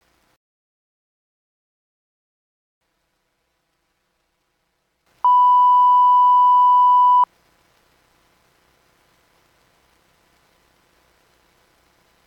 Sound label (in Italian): Sin 1Khz campionata a 24 kHz con motore a 12 bit attivo; Sonicware; Liven Lofi-12